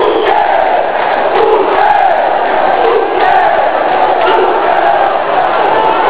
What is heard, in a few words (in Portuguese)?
gritos, portuguesa; portuguesa 0x2 nautico